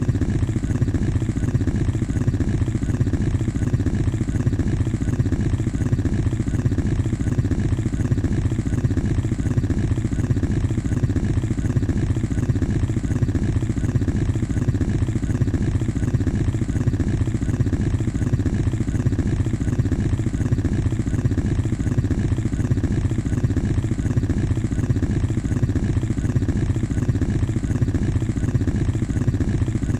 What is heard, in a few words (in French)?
valise tempo